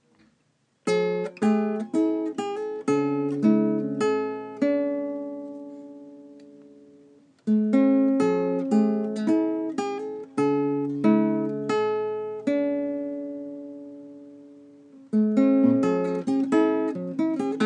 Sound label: classical guitar